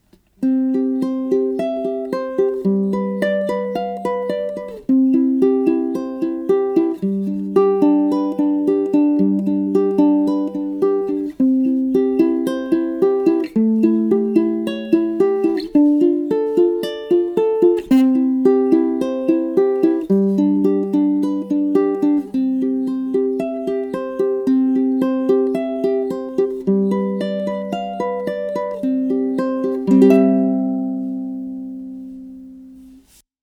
Backing, ukulele

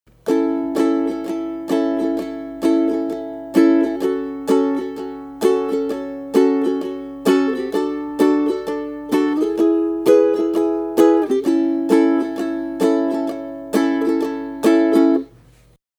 Strum, ukulele

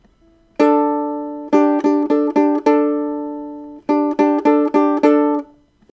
mirror lick, ukulele

